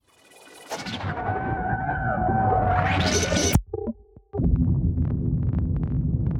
Sci Fi Implosion

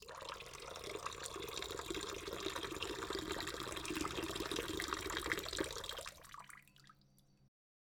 Pee

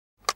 Close Mobile Phone

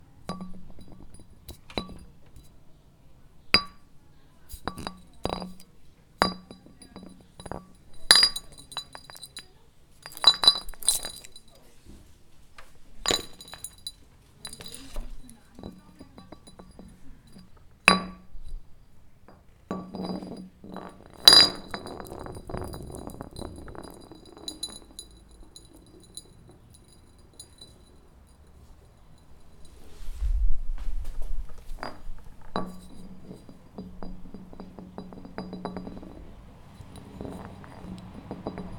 Glass Bottle Sounds

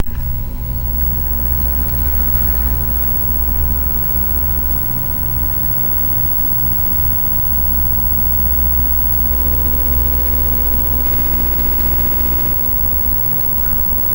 TV screen; Sony ECM-DS70P

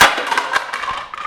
Shatter Rock Box

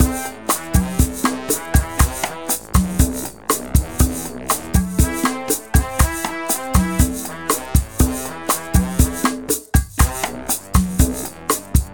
Loca Salsa

